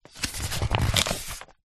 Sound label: household foil tray handle bend